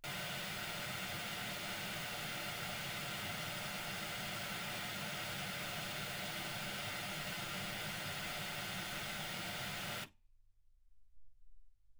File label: pink noise 48kHz